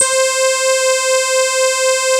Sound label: C5, synth line; 90 s Sample CDs; NiceBeats; SynthLine VOL-3